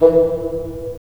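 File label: wind bassoon; LA Composer vol. 3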